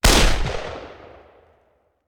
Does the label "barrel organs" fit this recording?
No